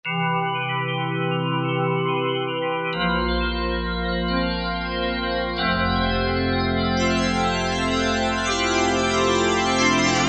Magic Organ, Korg M1; Factory preset examples

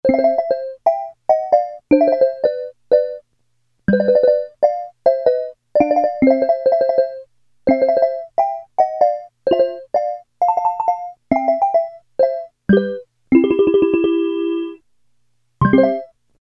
Marimba, Casio MT-600; Tones

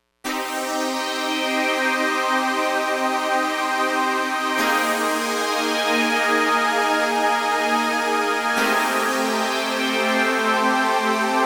Spaces Stack

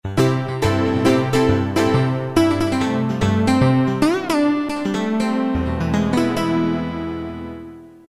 Elec Gtr, Roland MT-32

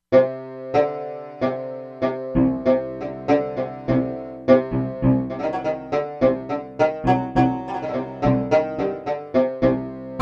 Rubab, Korg MSC-13S Ethnic

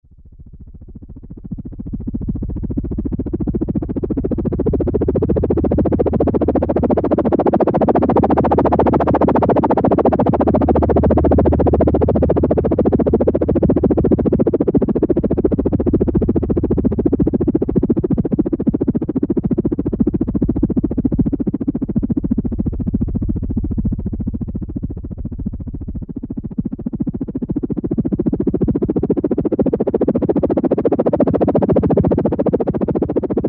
CHOPPER, Oberheim OB-8; Factory Patches

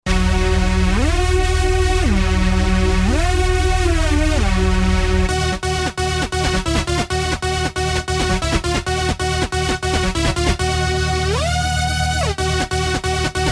Raver Circus, Roland SR-JV80-09 Session

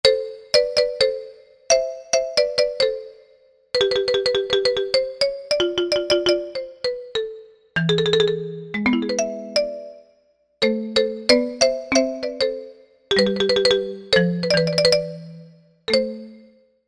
Marimba

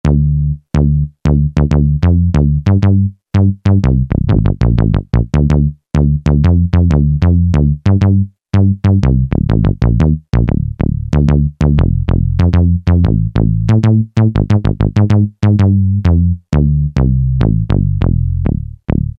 Phuture Bas

